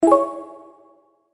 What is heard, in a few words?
notify